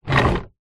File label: household radiator portable wheels roll very short wood floor